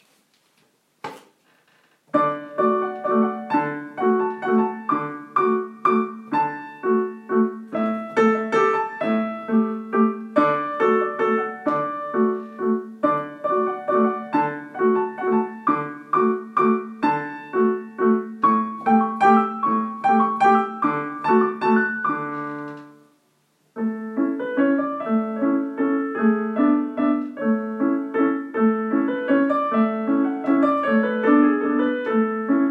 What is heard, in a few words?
Valse tyrolienne; Poulenc